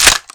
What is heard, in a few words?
bolt back; weapons, gamefreak